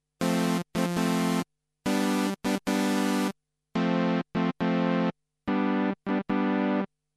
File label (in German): Cutoff Einsatz, Zwei Akkorde, zuerst ohne Filteranwendung gespielt, dann mit low pass Filter.; virusc